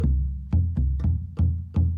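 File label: Calm Far, music; From Dust; PS3; Xbox 360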